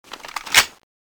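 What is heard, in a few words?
quad barrel draw, weapons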